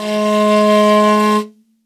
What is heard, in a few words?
L, morin khuur, World Instruments; Best Service ProSamples vol.52 - World Instruments 2; AKAI